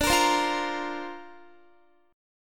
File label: D-Minor 7th Sharp 5th, D, Guitar, 4E, 4A, 5D, 5G, 5C, 6F; strummed